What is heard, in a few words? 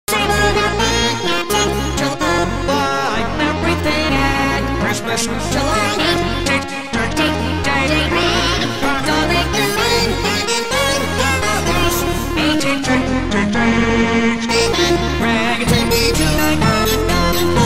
christmas acidjazz